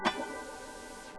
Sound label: Musica Asterisk